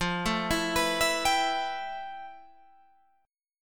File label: F Major Sharp 11th, Guitar, Open G